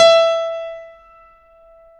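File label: SG1 PNO E 4, piano; Back In Time Records; Korg Universe VOL-1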